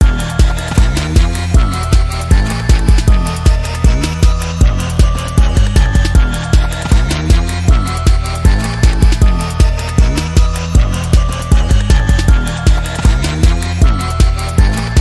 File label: its go time music; left4dead2; kodua; fortnite emotes